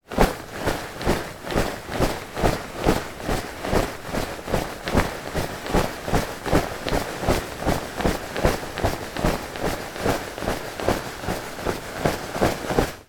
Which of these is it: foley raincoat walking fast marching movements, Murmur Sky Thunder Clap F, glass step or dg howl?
foley raincoat walking fast marching movements